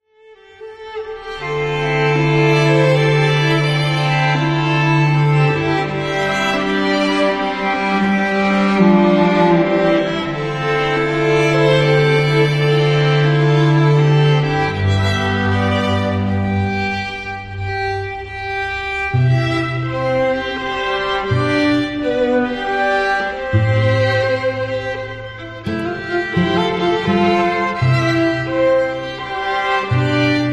Quartet; The Leos Janacek Quartet

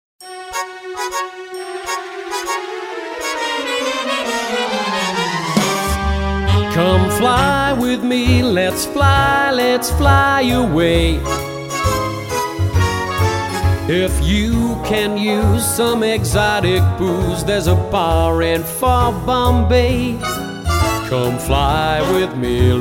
Wedding Singer

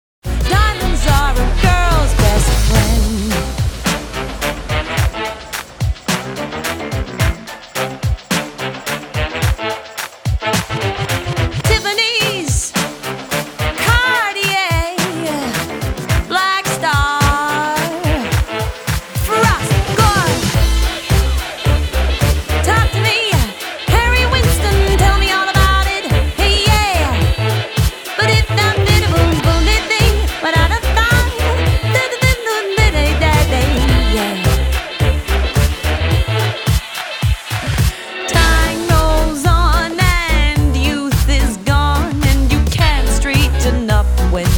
Swing Show; Dynamic Swing Show

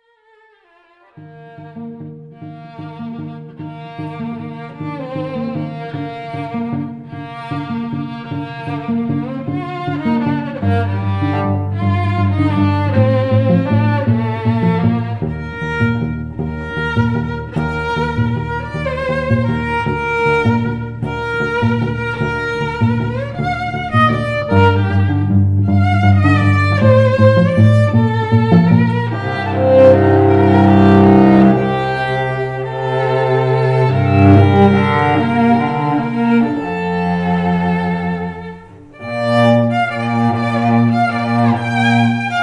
String Duo

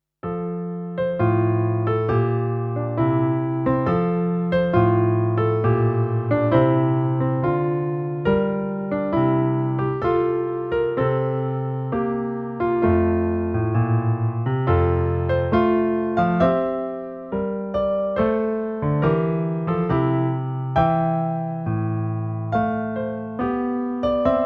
Solo Pianist; Air from The Water Music; Handel

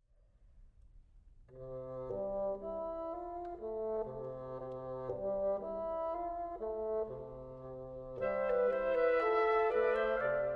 Trio

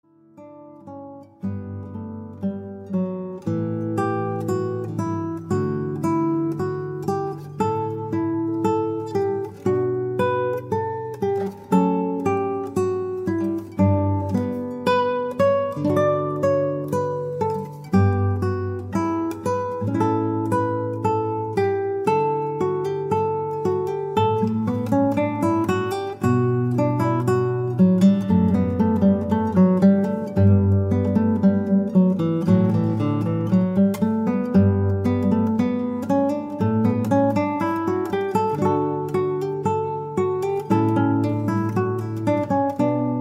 Solo Guitar

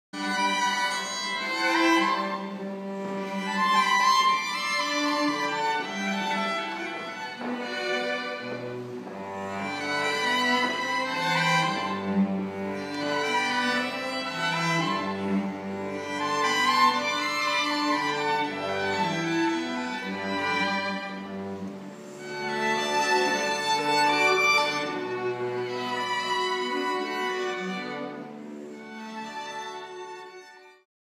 String Quartet; Vitto Quartet; Vittorio String Quartet

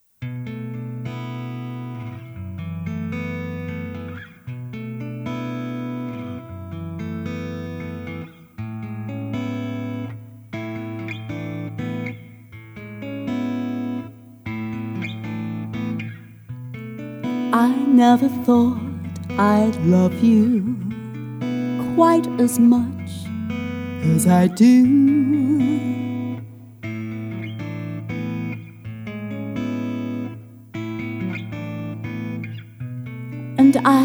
Jazz Band

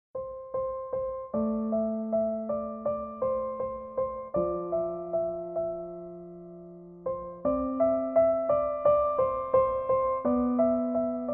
Solo Pianist

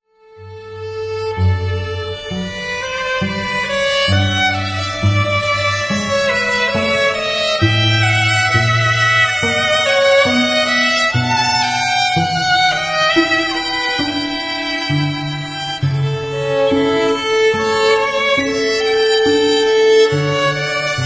Quartet trio; The Leos Janacek Quartet

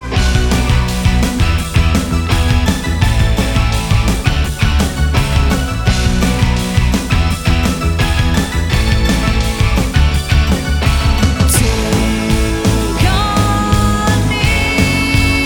4-piece (Female)

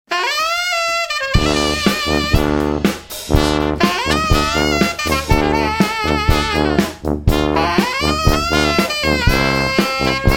Horns

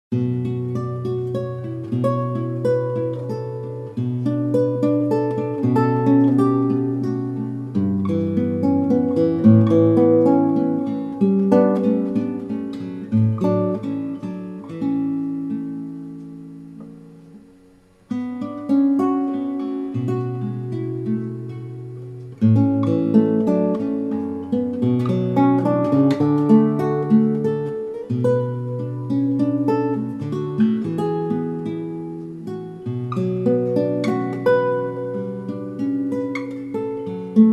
Solo Guitar

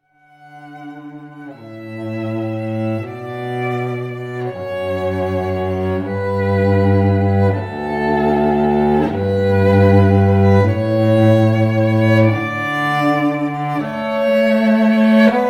String Duo Canon Without Chords

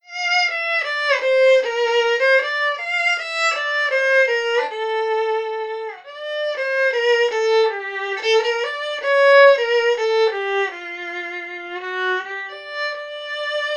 Violinist, solo

